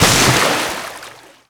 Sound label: zodiac splash small; veh zodiac splash small; Modern Warfare